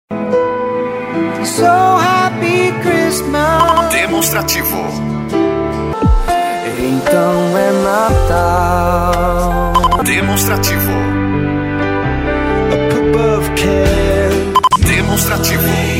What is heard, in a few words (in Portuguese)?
MUSICAS NATAL